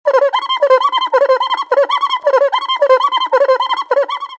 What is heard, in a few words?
cuica; musical instruments